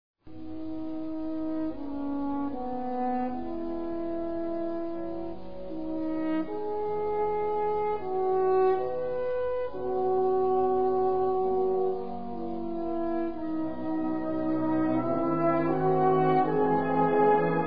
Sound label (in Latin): cantus choralis fa